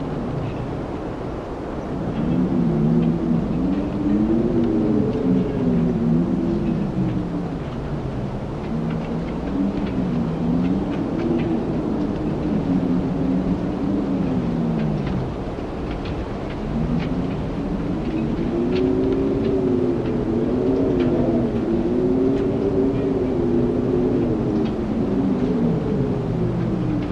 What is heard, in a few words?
Wind town